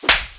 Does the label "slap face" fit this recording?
Yes